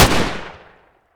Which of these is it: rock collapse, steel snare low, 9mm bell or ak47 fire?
ak47 fire